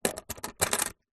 foley money coins small pile movement; zapsplat

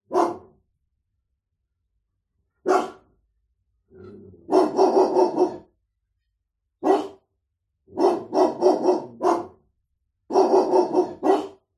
animals dog medium sized barking slight distance

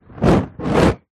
nature fire flame torch whoosh air past

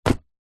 laptop case fabric empty set down wood table; foley

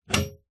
household night light remove from socket

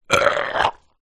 human male burp soda disgusting; zapsplat

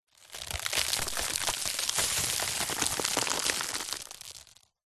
foley wood crack splinter dust debris fall; zapsplat

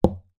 dart hit dartboard

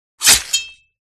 Sword Stab Through Ch; Audio Hero

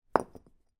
foley brick place down on hard ground; zapsplat

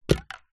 industrial rust spray can drop carpet

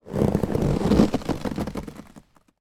leather chair cushion get up squeak creak; household

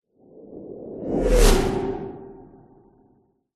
whoosh element pass by machine vehicle; science fiction

